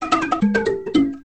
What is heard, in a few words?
conga